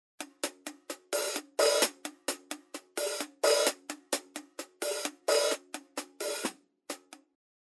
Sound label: drum HH soft